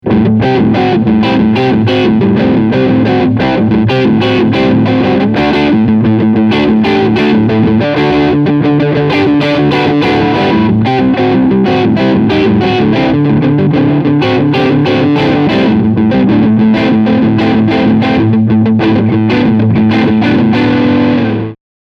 Crunch beide PUs

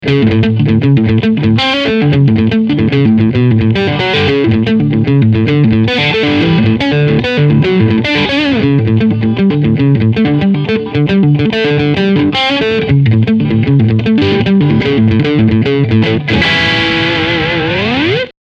Crunchsound

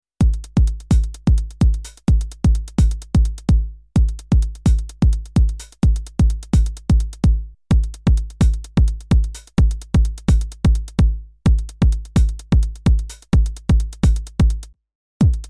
7X7 Vergleich alte neue 909 Kick

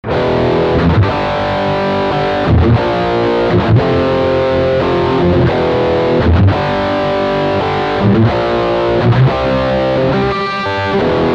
Riff Steg humbucker

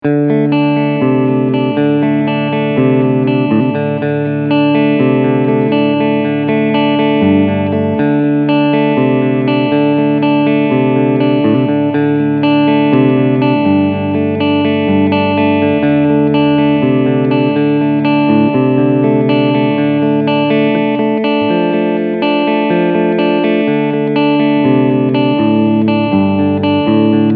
Cleanpicking beide HB

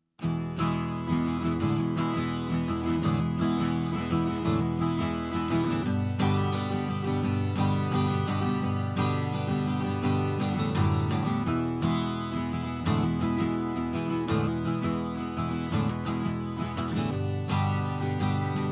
Guit, Niere, Preamp; Orpheus

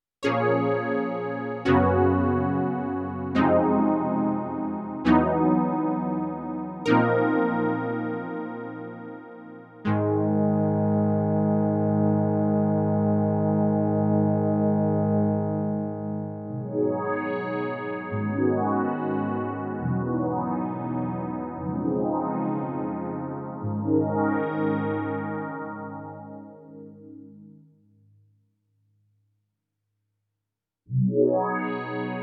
Filtertest

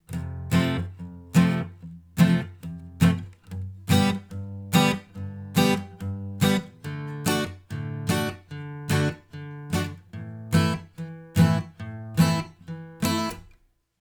Gitarre; Mackie EM USB

